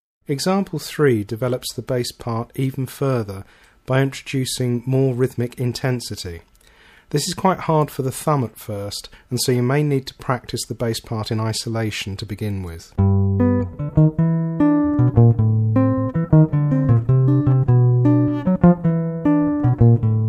Rhythmic Intensity, guitar

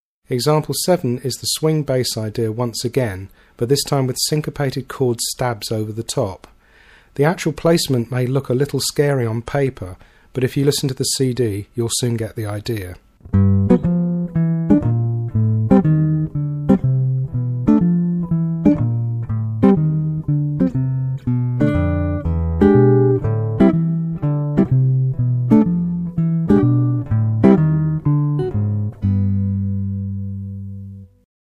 Swing Bassline With Chord Stabs, Guitar

